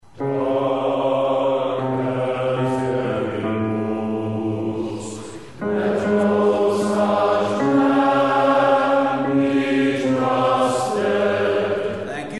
Serenade SATB bass lead in notes

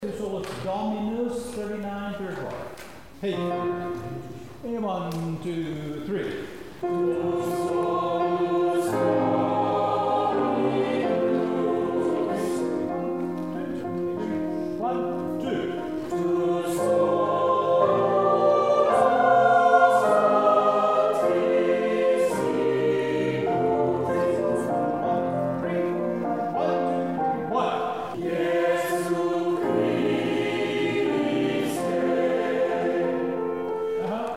Choir 3 only; rehearsal files

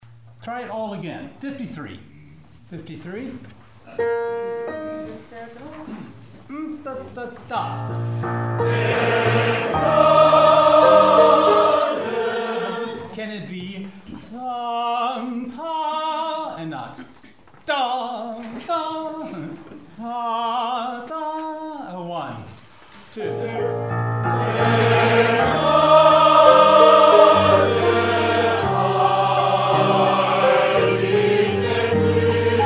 Pilgrims Chorus end SATB; rehearsal files

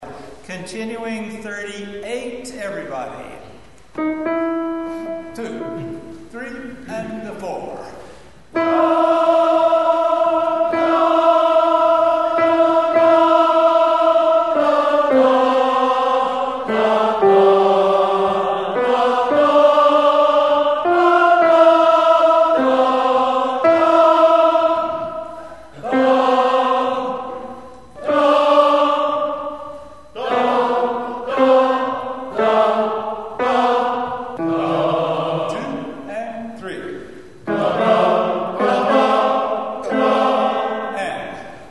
Anvil Chorus SATB notes

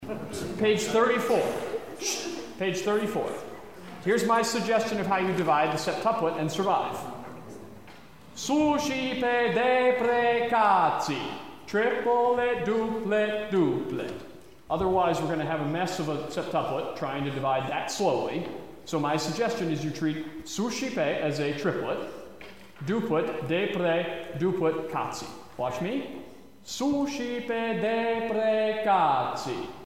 SA Choir 2; rehearsal files

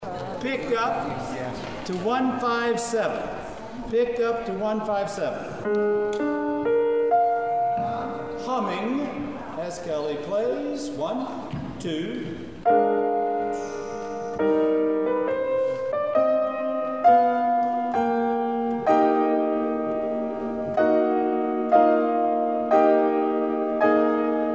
notes piano; rehearsal files

